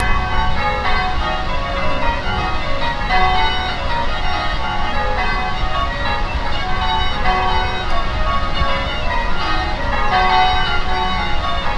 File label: bells